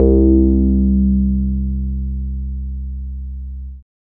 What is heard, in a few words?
square; web sequencer